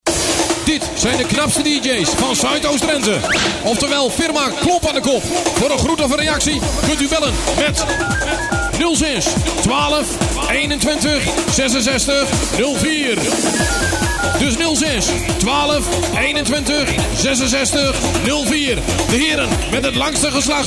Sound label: Jingle; Audio Fragmenten